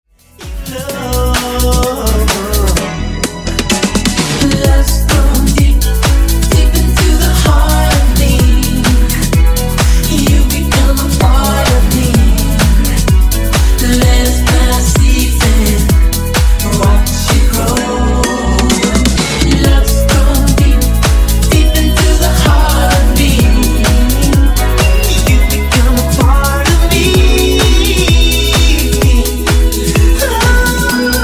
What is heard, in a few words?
Funk Bootleg 128Bpm